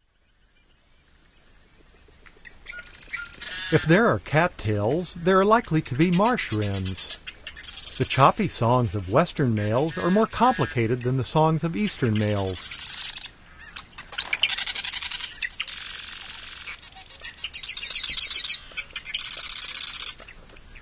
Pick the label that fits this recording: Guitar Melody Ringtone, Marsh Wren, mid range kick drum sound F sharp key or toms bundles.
Marsh Wren